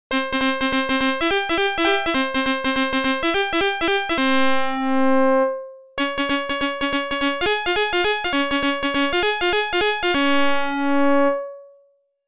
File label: Organ; NHL 94